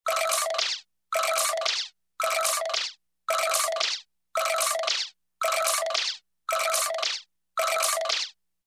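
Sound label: Cartoony Machine Loop, cartoon gadget fx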